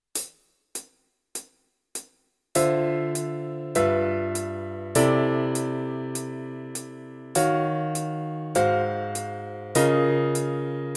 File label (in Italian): fisarmonica accordion, ii v i maggiore; mano destra; Pillole di jazz… I Voicings – Lezione n. 5